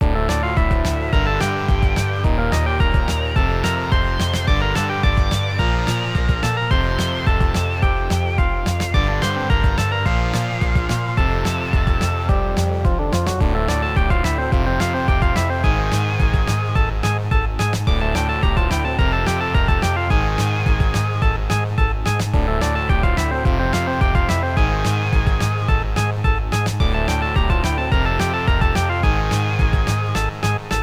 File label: Background Music; Udemy Card Battler